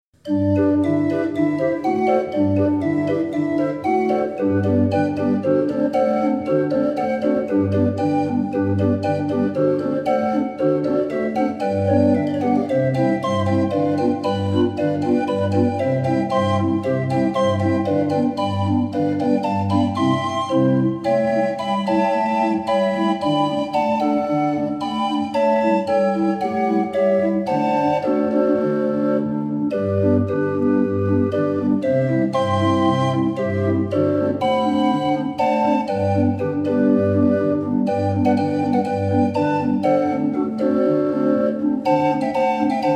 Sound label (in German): 26er Drehorgel